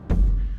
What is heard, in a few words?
close animations